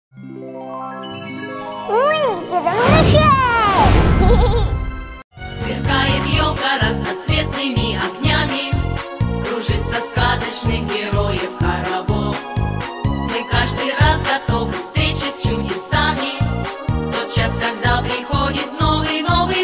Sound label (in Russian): detskie novogodnie pesni